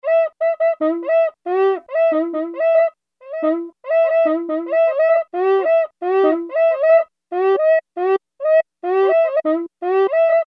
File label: Cuica; Yamaha A4000; World - Latin Instruments